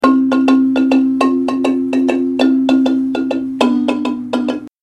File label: high, marimba